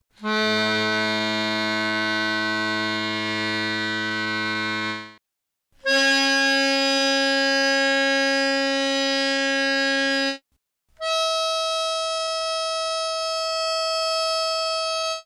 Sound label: Accordia